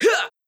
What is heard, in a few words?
combo, onehand sword; assassin